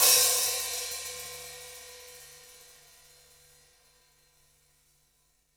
open hat, gretsch; Dirt-Samples

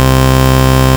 square

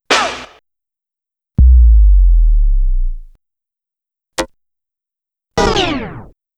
stabs

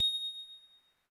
tink

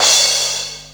DX Lo Crash

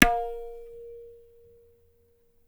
tabla; Dirt-Samples

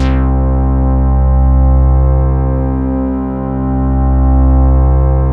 Mighty Moog C3

